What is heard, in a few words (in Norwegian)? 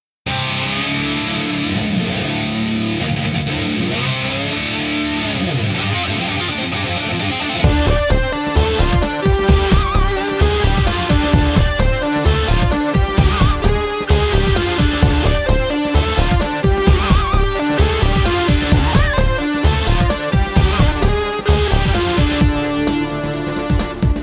karaoke; overmaate fullt av naade; salmeslag